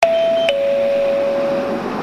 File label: bus chime; 国際興業バス